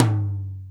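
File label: Md Dynmic, Alesis D4 drum machine tom; 300 Drum Machines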